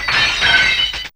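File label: Break Glass, Fairlight CMI IIe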